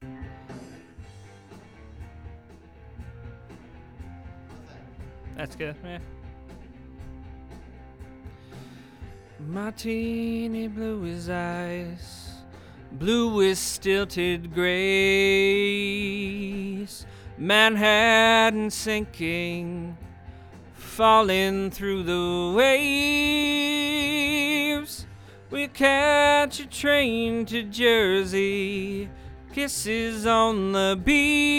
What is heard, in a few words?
VOX